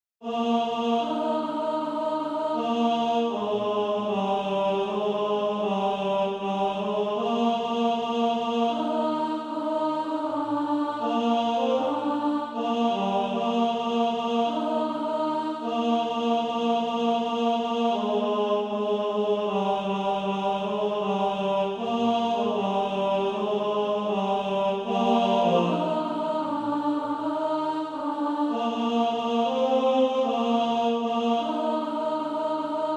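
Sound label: tenor, Practise Music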